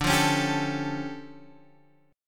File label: D Minor Major 11th, down, Guitar, Open G